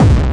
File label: hugely distorted bd; Kicks; single hits